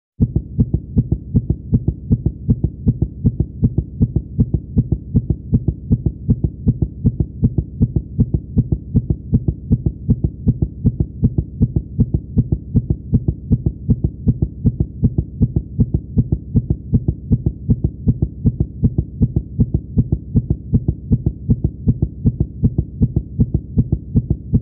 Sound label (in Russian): sercebienie